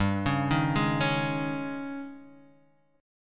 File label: G sharp Major 7th Suspended 4th, G